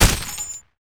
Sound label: KRYTAC